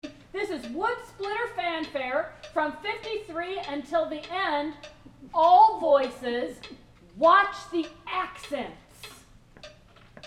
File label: Wood Splitter Tutti